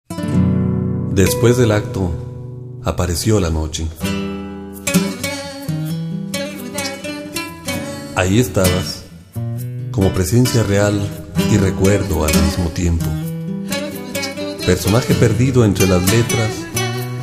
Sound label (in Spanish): Poema vuelto canto